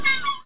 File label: seagull